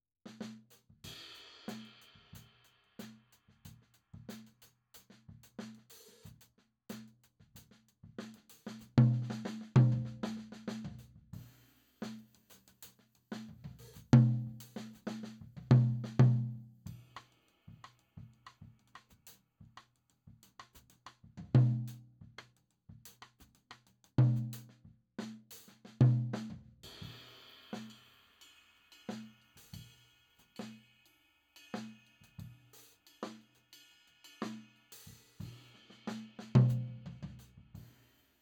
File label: Tom